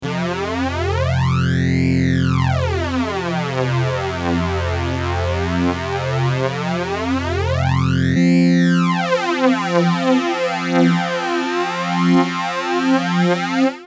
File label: ada flanger